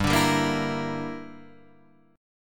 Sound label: G Minor 6th, Guitar Standard; Gm6 strummed; JGuitar